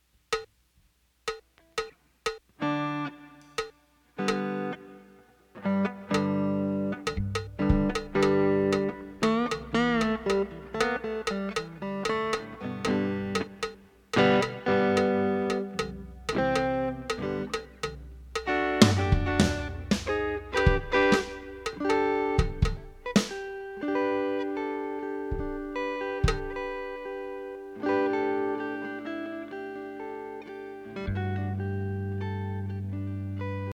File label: Expensive Drum Samples Mix